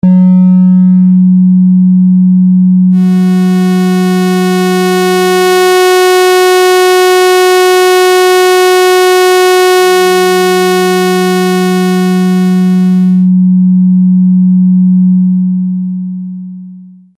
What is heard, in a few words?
manual skew; Thomas Henry